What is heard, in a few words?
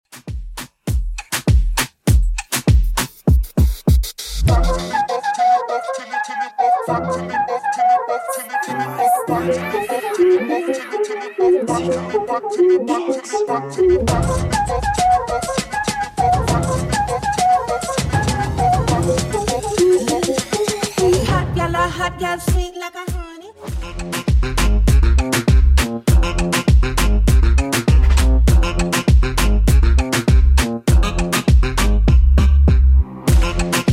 Moombahton Redrum 100bpm